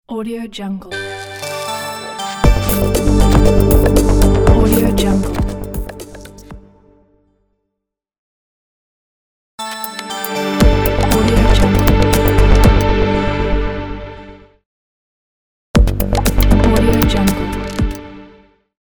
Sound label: good success logo